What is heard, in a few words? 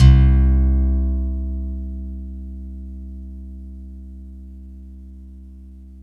SLIPPICK; MORPHEUS